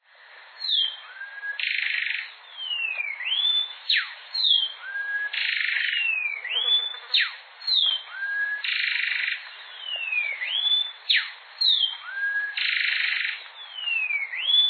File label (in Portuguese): garrinchao coraia